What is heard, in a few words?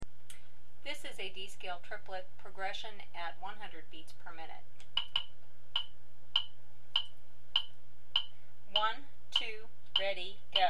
D Scale Triplet Progression 100bpm, mandolin